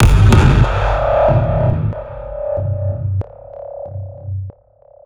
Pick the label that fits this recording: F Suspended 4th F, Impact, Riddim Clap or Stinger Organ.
Impact